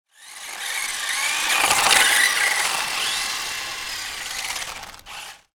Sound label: RC toy car remote controlled passing by fast; rc toys